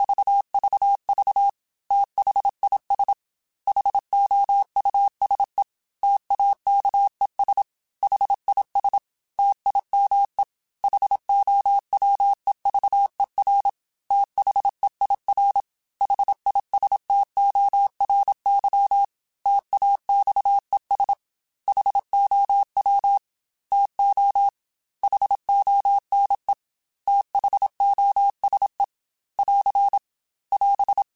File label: T then H Runs, Morse Code; CW Code Groups; Mixed; Single T or H; HamRadio; Prefixes